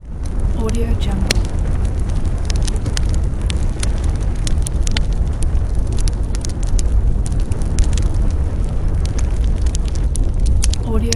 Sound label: fire crackling